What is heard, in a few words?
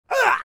Grunt Male Strained Effort or Punch Reaction Close; Human Sounds